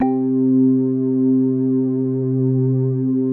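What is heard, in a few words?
JUNO ORGAN, PADS; Masterbits; Vintage Voltage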